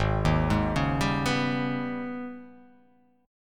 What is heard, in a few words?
Ab Minor Major 11th, AbmM11 chord; arpeggiated; JGuitar